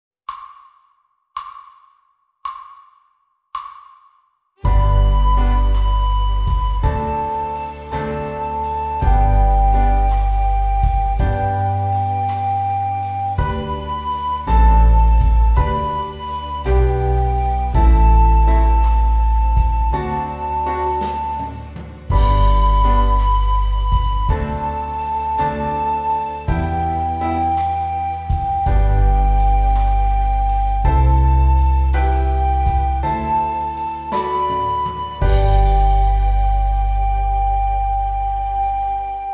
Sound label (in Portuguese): Flauta Doce Estudo; licoes para flauta doce